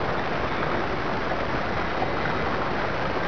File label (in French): eau